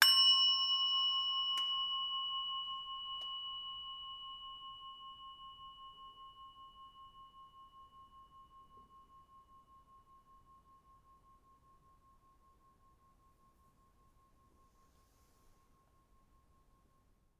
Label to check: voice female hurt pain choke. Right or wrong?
Wrong